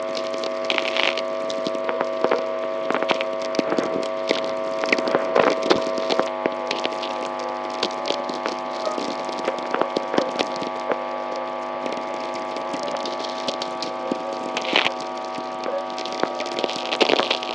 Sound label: electricity earth